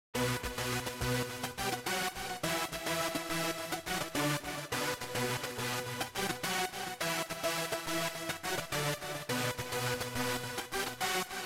Synth Chor; Remix Sample Pack

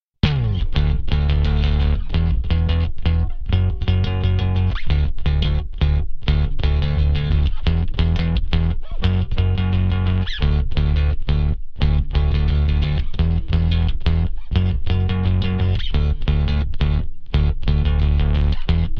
rhythm

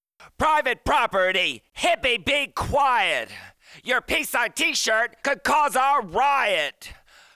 Vocals

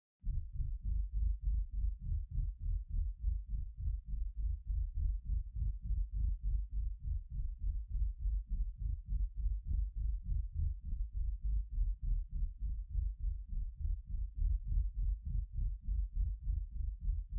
bass; Tron Legacy; Stems

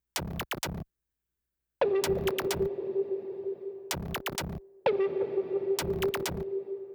synth perc

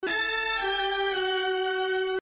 Strings

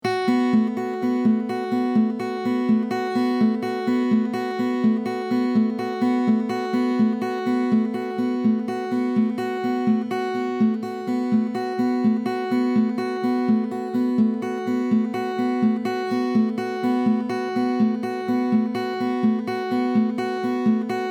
Gtr Oiseaux

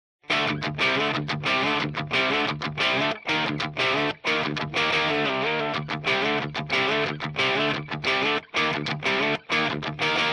guitar

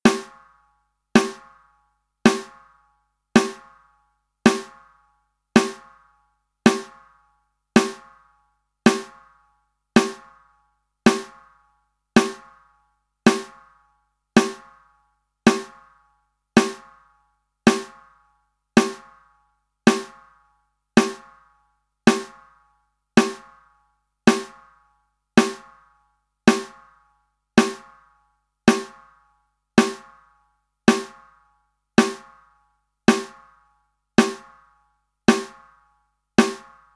drums

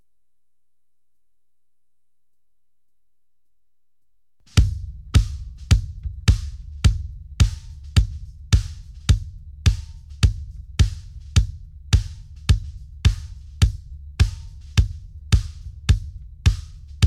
drums

